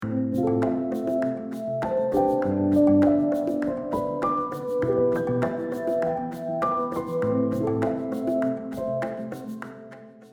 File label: softpiano